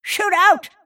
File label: deny pain; Snapfire